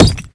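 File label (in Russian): удар молотком по металлу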